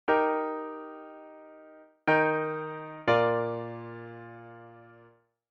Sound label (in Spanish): Semicadencia I a V, Eb; auditivo de cadencias autenticas plagales rotas y semicadencias; Identificar la cadencia